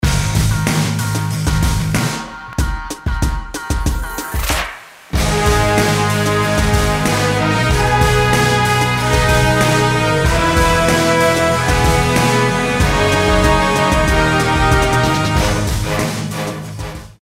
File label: Ring Tone; Hulk Coaster